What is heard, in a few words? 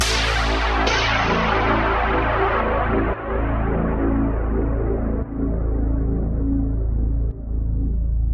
115 A Drone Big Shots; Zero-G - Future Wave; Drones